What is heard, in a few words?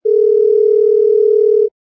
OLD CALL OUT; skype